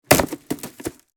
Hamper drop floor impact; basket

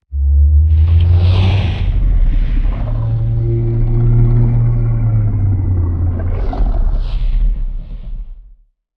Large aquatic dinosaur underwater call

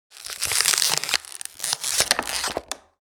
Plastic bottle squeezing and crunching; gfxsounds

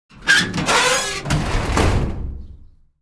Metal prison cell door slide close and latch

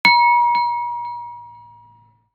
Meditation bell ring chime tone

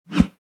Text whoosh

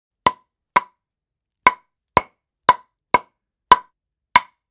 Hammer hitting wood panel hammering

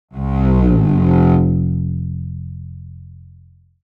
Cartoon disappointment

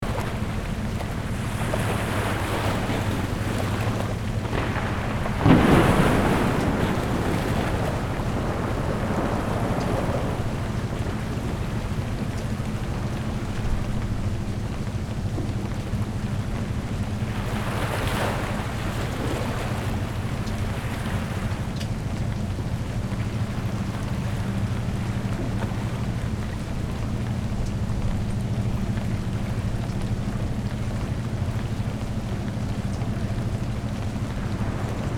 Sea ambience with distant ship engine

